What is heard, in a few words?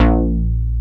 funky bass; East West; Global Sounds